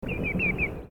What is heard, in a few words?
tringa nebularia, birds calls; scolopacidae